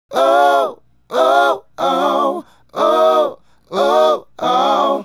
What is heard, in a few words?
Male Group, Vocal